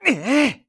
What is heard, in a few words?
Lift Heavy; Link